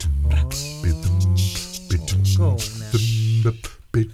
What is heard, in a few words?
ACCAPELLA, DOO WOPPER, Blues & Soul